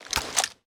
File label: drop; gmod; viper; weapons